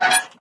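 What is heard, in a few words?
random metal squeak; ambient; Left 4 Dead 2; Source Engine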